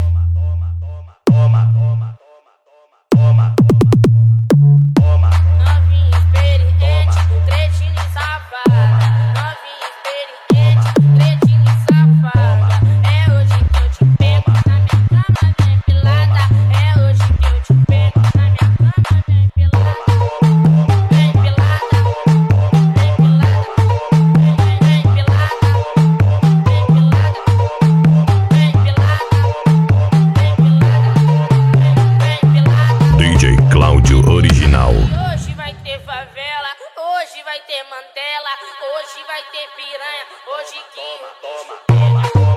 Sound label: funk bass